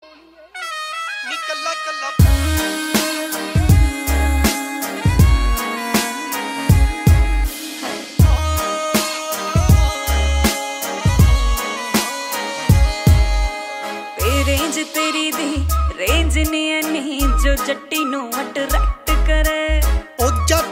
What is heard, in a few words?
Romantic, ringtone